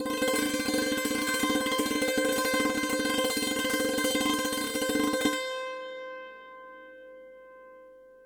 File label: Sant Roll C5